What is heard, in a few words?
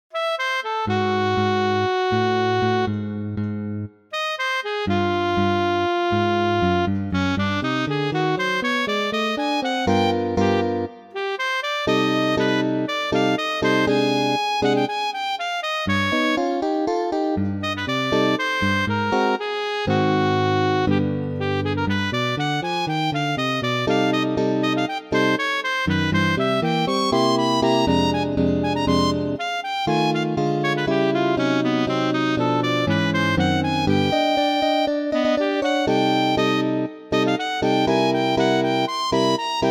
Tango